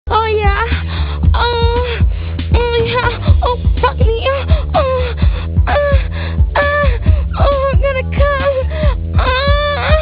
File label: orgasme